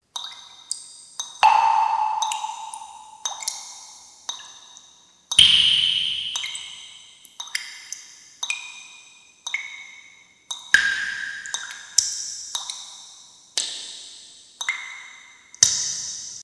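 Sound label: group of water drops dripping sound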